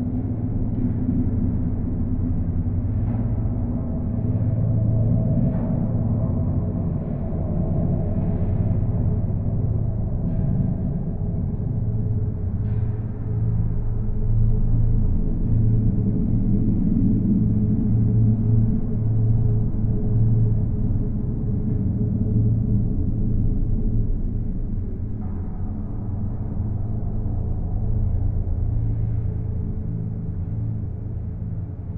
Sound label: drone interior; Counter Strike Model Pack; CS:GO; aztec2